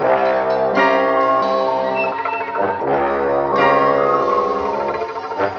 horns, jazz loops

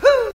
woo female; ooh ahh; ho hoo woo

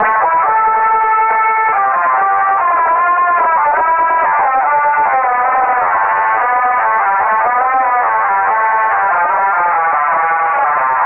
filters failed, loops electronic

